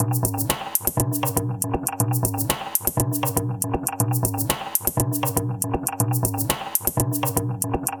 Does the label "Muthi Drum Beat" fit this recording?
Yes